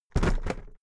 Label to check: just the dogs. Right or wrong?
Wrong